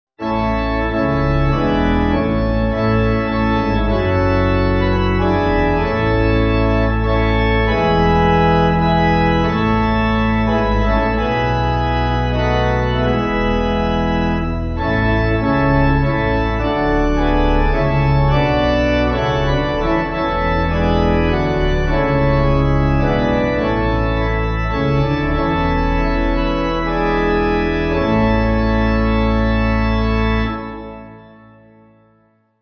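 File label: Pipe Organ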